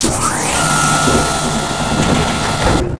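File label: elevator mechanical op, doors elevators; Star Wars Obi-Wan; XBOX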